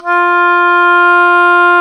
oboe, Oboe Brth

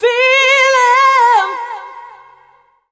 Dance Vocals, FEMALE

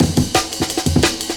amen pt, amen particles

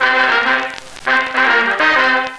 Old Scratchy Sect, Effected Horn Section; Sonic Foundry (Sony Creative Software); Jazz Solos and Sections

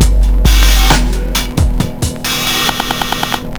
67 bpm, breaks; Slomo; Zero-G Beneath the Planet of the Breaks

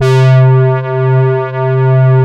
Poly800 C2, Korg; Back In Time Records; Korg Universe VOL-1

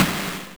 Snare deep; Genesis

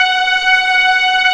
STR, Orchestral Strings

